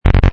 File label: Error, Button sound; Multimedia